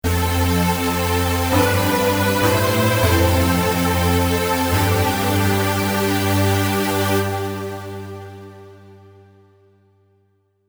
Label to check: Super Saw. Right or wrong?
Right